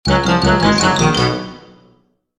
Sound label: Playful Snippet Down